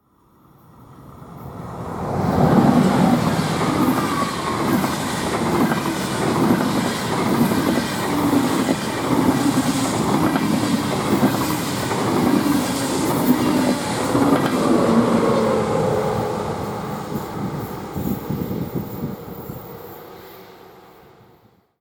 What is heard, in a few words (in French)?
trains diesel et electriques; sonotheque